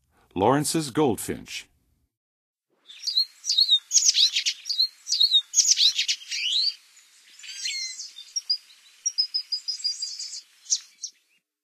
Lawrences Goldfinch, Songs of Birds of North America; Western USA; Western USA Songbirds